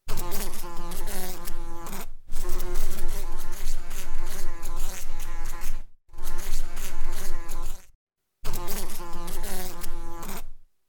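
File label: gadfly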